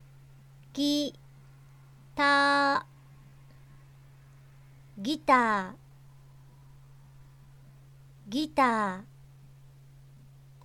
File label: guitar